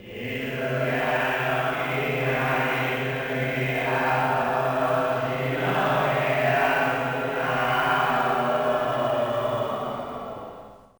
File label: Warp; Sengoku 2; arcade